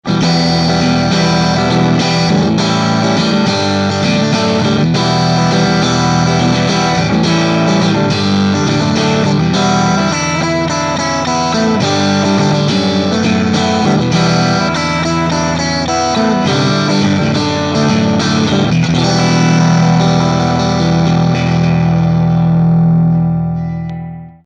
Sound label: Crunch Neck Mid